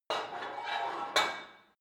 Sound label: put weight onto barbell quick, fitnessstudio